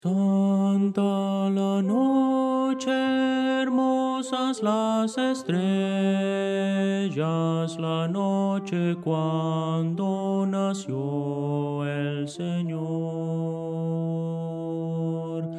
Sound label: tenor